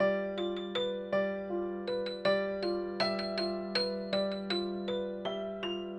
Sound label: Delicate Piano